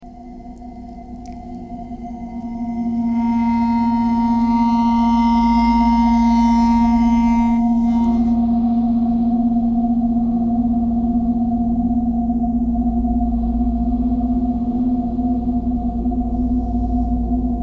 ice bowed vibes traffic k core birds thread pentatonic; bowedmetal